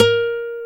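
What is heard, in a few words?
GUI NOTE, acoustic guitar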